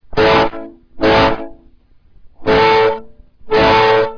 Back Pressure Chords

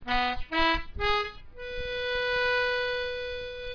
Single Notes, diatonic

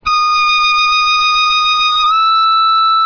Blow Bend Vibrato, diatonic

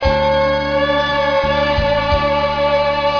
Dip Bend

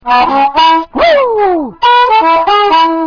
Whoop, diatonic

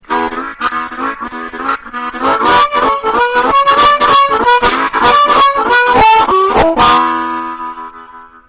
Vamping, diatonic

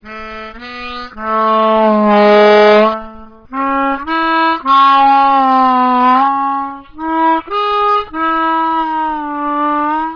Valve Bends, diatonic

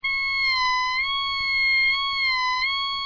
Bad Blow Bend, diatonic

